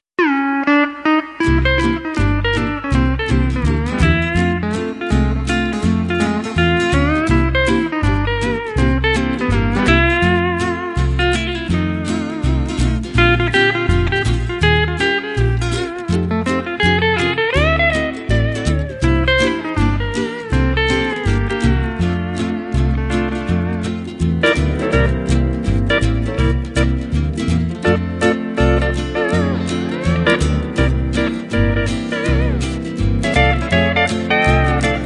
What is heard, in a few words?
Hula blues, lap steel guitar